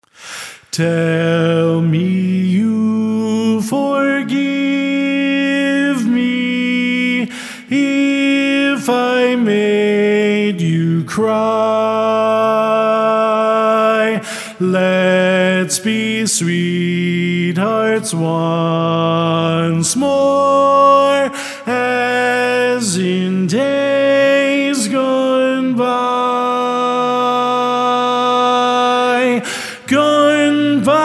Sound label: Lead